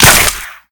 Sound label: Wall Hit; blackshades